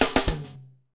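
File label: hip hop, Roland drum beats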